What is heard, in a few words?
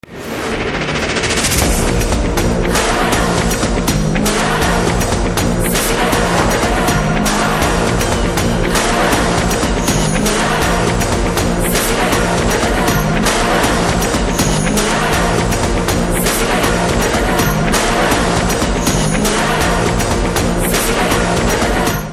Trailer BGM Theme; Akhanda; Telugu